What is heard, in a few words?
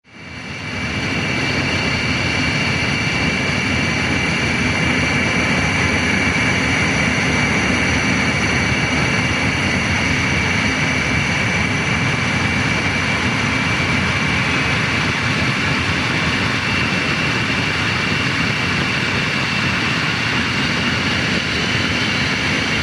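F15 Jet Exterior Close; eagle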